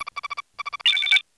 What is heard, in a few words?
eng readout sensor